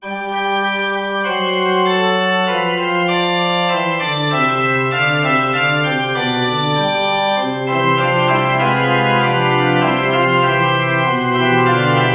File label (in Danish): forspil